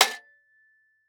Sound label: Impact Sheet Metal Hit By Medium Metal Pipe